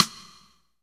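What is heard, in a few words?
snare drum, Real Kit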